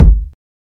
verby kick drum sound g sharp key, Royality free kick one shot tuned to the G# note; kick drum samples